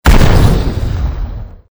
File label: gun